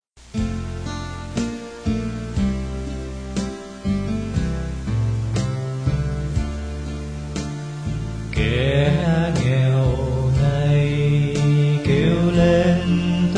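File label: soprano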